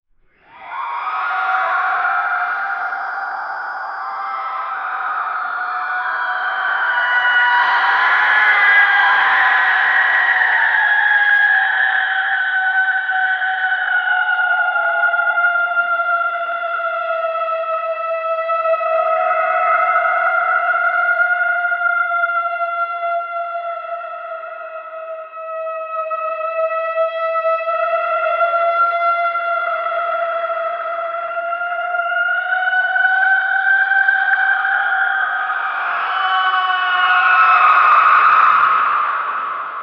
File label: void logo scream; branding